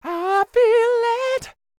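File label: falsetto, R&B Dance; Spectrasonics Vocal Planet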